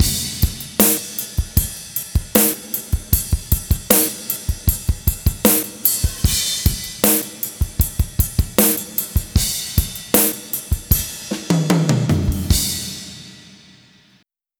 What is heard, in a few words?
rh drm end, Rock Heaven Drums, 077bpm; Quazibeat